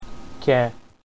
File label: cat